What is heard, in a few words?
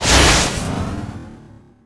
time lapse; weaver